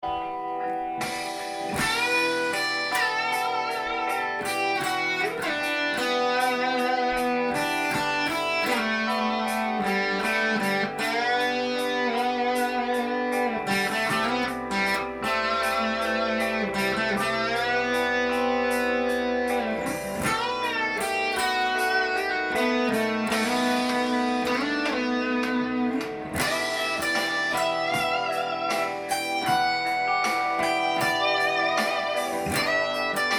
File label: em ballad solo, guitar